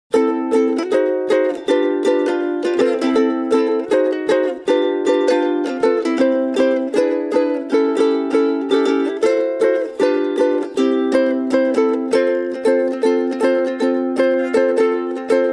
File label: Ohana SK 14 solo